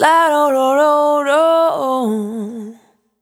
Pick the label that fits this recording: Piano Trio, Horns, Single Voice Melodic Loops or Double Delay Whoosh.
Single Voice Melodic Loops